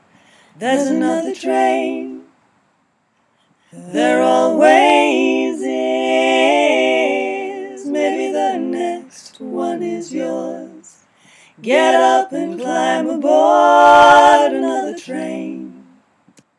chorus 3 parts

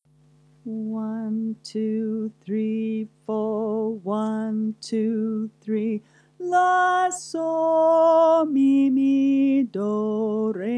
Auld lead solfa chorus; Auld Lang Syne Resources

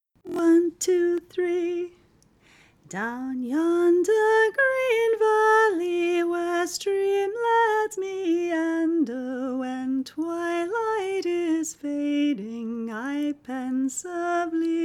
The Ashgrove soprano; Folk Choir

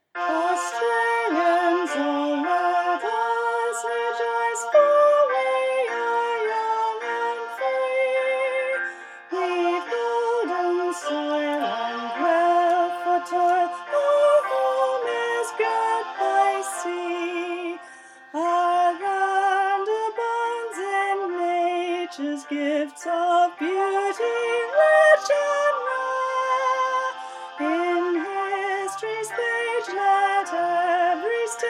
Advance Aust Fair soprano; Voices of Remembrance resources

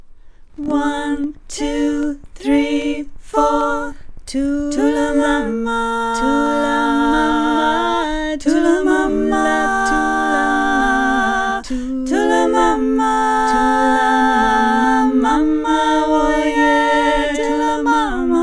melody and synco parts

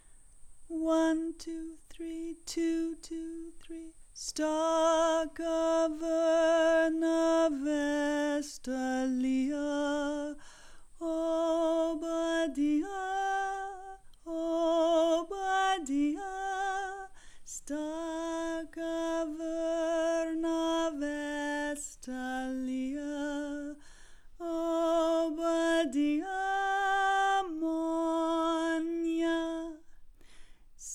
melody on mi

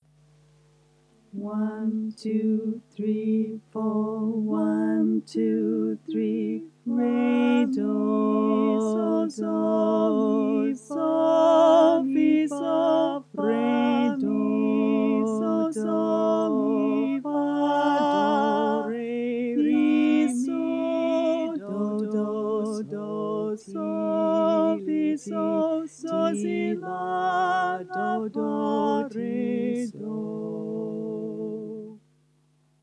Auld bari bass solfa chorus, Folk Choir; Auld Lang Syne Resources

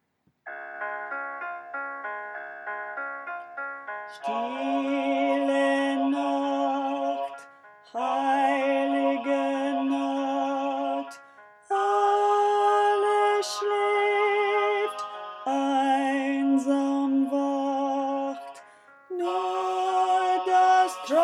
bass; Stille Nacht; Voices of Remembrance; Folk Choir music

